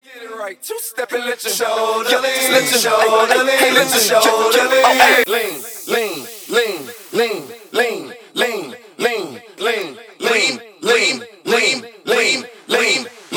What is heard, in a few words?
Acap In HipHop WordPlay Mashup 97 BPM